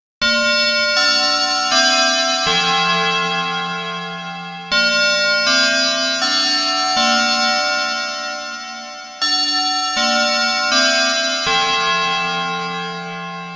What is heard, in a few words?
Class Dismissed, alarms; Asus Zenfone-3-Deluxe